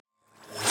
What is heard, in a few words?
Wire Cut